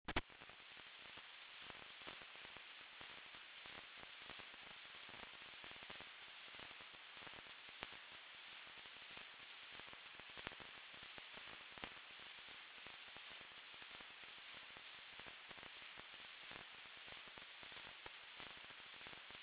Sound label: noise; speaker recognition py